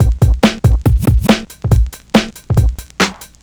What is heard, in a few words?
Breakbeat 140 BPM